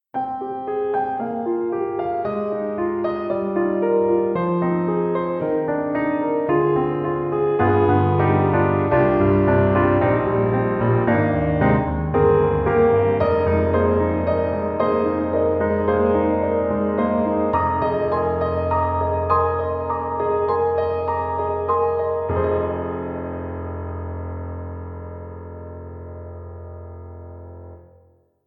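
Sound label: PEARL CONCERT GRAND, piano; IMPACT SOUNDWORKS; Beach Song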